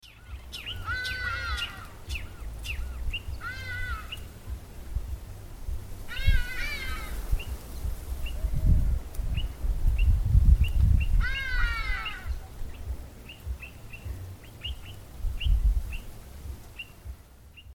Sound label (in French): cri ibis hagedash bostrychia hagedash hagedash